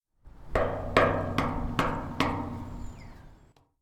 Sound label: Redbarn Electioneer statue Knocking